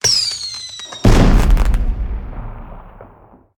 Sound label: Firework Explosion; angry birds